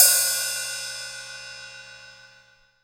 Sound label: Hat long; Akai MPC500; Hats TKit